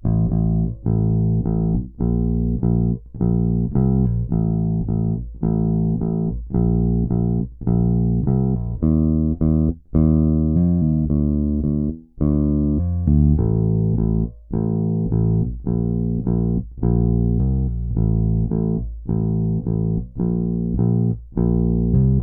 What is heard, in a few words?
BASS